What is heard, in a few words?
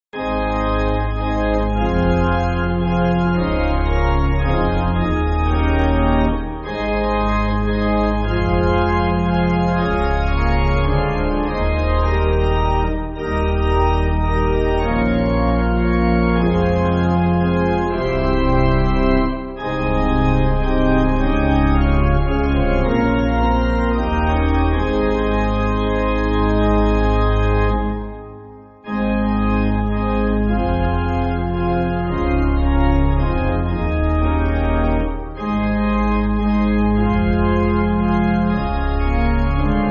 Pipe Organ, Music Backing; Holy Spirit Truth